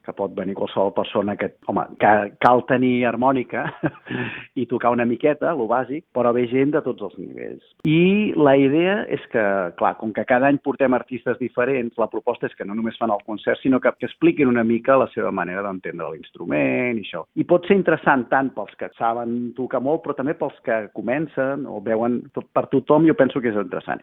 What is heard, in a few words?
masterclass harmonica